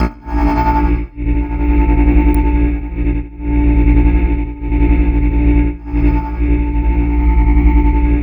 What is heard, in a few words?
VOCODE, Twisted Textures